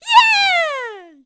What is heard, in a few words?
Run; Ivan running; Killer Bees leader; Zelda